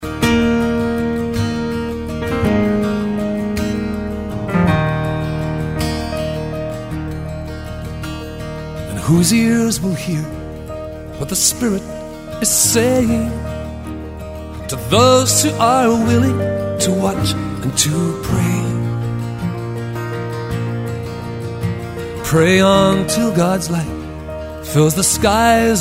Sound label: Piano